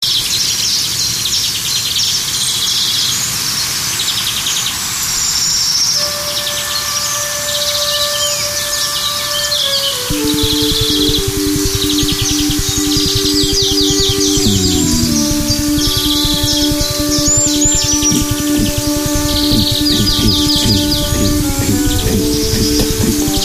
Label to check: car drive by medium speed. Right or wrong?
Wrong